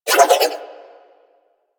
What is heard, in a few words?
Nunchaku Spin Whoosh; Weapons; Warframe; Tenno